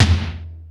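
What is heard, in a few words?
GATE tom; Crypton; DrumBase5000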